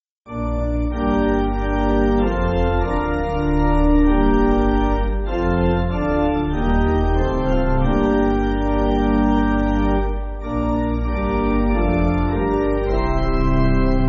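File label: Pipe Organ, Backing Files for Churches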